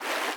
fast water, river sounds

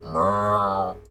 cow calm

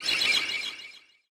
vampire bat hurt

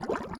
running water, river sounds

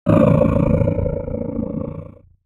wolf angry

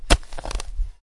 shovel

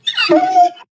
adlet hurt, utterance

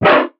wolf hurt

